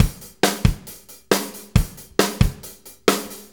Petty, Drum Tools, Loops; Sonic Foundry (Sony Creative Software); Loops & Samples Drum Tools

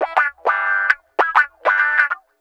Guitar Loop; Ambient Grooves

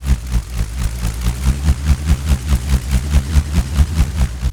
BULLROARER, Primitive Instruments

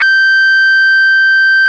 GTR, Dirty; Club-50 - Foundations; Paul-Stack

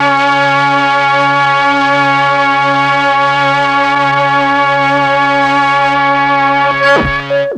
MEL STR, Legendary M400; ILIO; Propeller Island

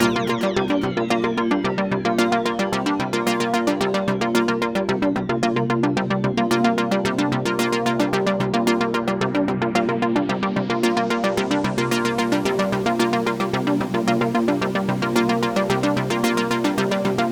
synth arp; Electrocution

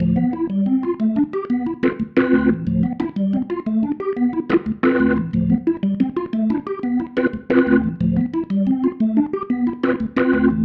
Pattern, Organ

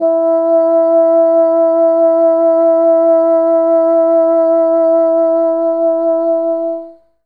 Bassoon E3, Classic Orche; Best Service ProSamples vol.51 - Classic Orchestra 2

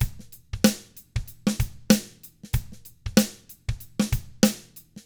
95 funk, drums; ILIO; Double Platinum Drums 1

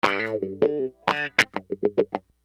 Wah Guitar; Construction Kit; HIP-HOP; Sonic Foundry (Sony Creative Software)

